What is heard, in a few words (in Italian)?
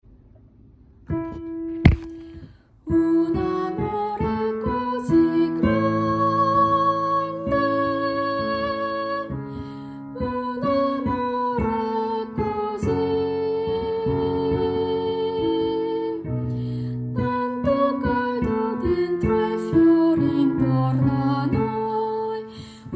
un amore soprani